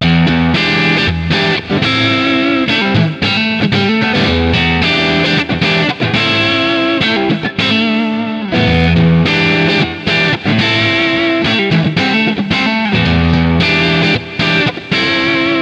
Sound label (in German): DI Aufnahme Treble Booster aus Plug in